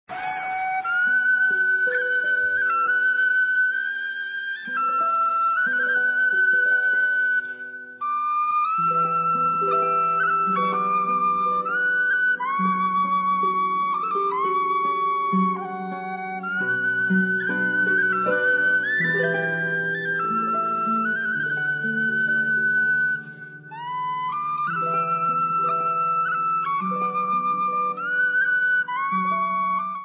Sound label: Galician Medley, Mazurka, Muineira; Muineira De Jios